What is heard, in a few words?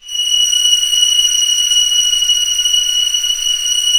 STR C6 FF L, STRNGS, Orchestra